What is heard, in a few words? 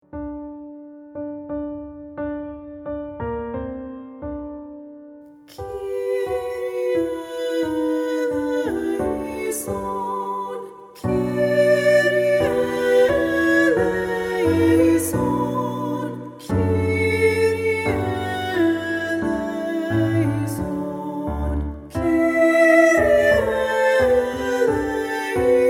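Balanced Voices, SATB Honor Choir; Kyrie Audition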